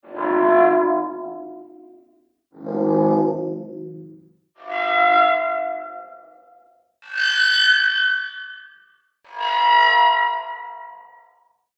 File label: Alien Warning Sound Collection